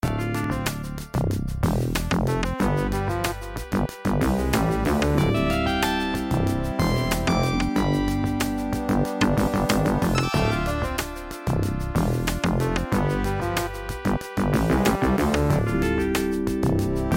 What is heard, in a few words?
chill jingle